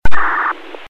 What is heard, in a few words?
FleetSync Data, PTT Sounds